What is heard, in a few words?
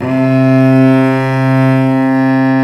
STR VC Arco Solo p